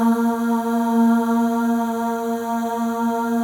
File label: FEM AHS; Spectrasonics; FEM.1 AHS